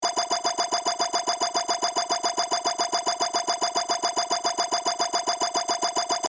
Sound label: Sci fi atmosphere unauthorized access loopable